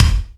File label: BD, Yamaha RY-10, Drums